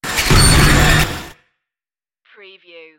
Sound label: sliding pneumatic door